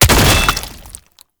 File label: Strike Leap Hit; Boss; Enemies; Abilities; Warframe; Oraxia